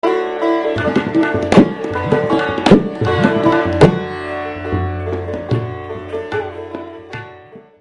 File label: vilambit Tabla Tihai